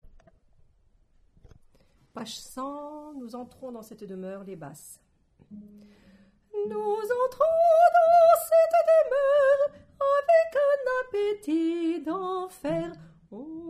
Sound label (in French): Basse